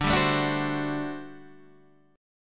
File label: G Major 7th Suspended 2nd Suspended 4th